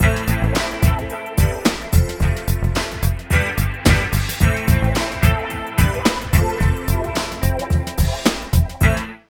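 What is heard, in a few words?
LOOP, left; East West - Phat & Phunky CD2; 90's Sample CDs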